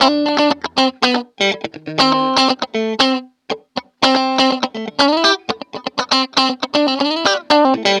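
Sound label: GIT-CHRDLICK, guitar chord lick, Disco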